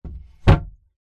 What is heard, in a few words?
foley wooden box crate lid put on recorded from inside; zapsplat